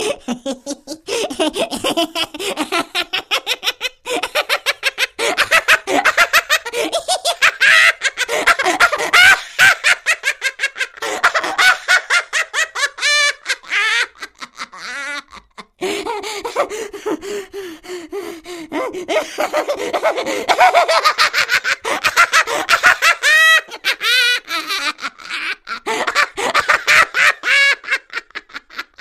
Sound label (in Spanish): Ataque De Risa